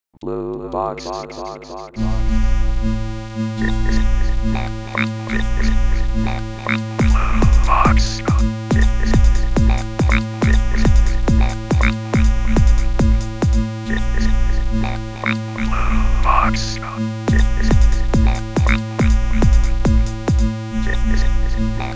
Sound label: jingle; bluebox